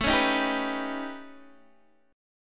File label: A 9th Flat 5th, Guitar Open D; chord